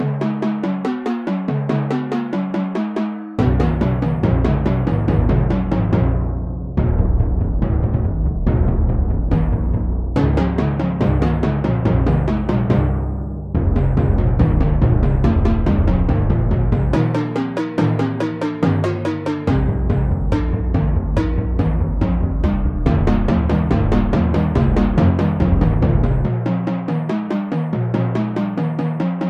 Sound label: Drum; The Bard's Tale Remastered Trilogy